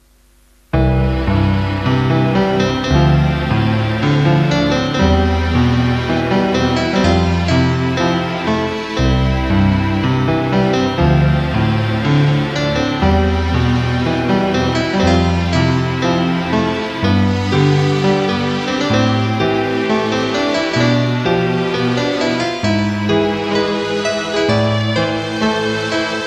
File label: Midi Keyboard; AWE32; Hjemmelavet Fra Gamle Kasettebaand